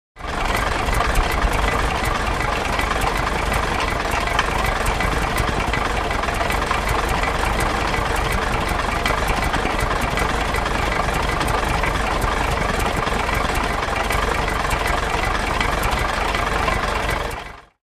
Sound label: Stearman Idle Low, stearman